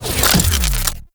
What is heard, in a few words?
Rifle Reload A; Tenno; Gyre